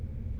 spinner spin; Mrekk 3.0; Abyssal

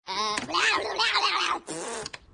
fail sound; minionalist

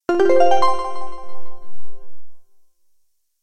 match confirm; megamix

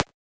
menu click; JesusOmega {NM} 『Planets』

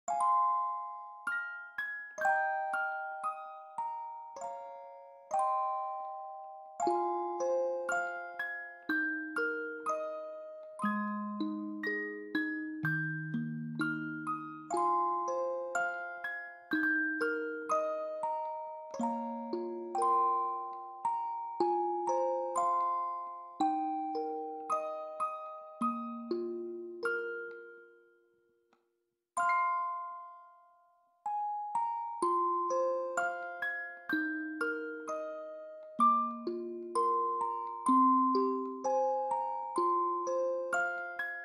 applause; HowlSakura; Aesh

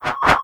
menu click; Crimson Stella

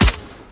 normal hit finish; Clicking Head

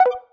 pause retry click

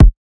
drum hit normal; izduh v4red temu

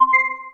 menu hit; Eliteronix

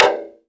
taiko drum hit clap; Crimson Stella

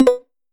match ready; Crimson Stella

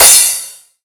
normal hitfinish; MonkoPastel

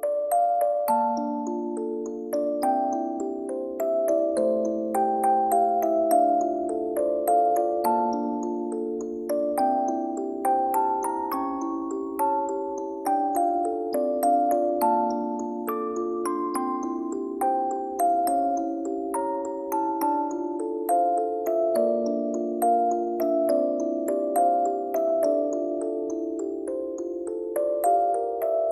applause; DTMoon

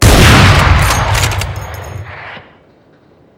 key confirm; Clicking Head

